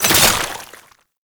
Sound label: Wrist Gun Alt Shot React; Warframe; Tenno; Incarnon